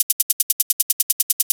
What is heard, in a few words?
hh only